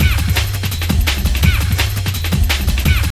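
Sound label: drum loop; X-Static Goldmine 3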